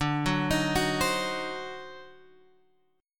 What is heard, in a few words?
D 7th Flat 9th, Guitar Open G